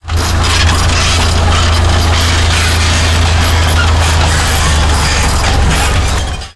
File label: turn rattle, tanks; War Thunder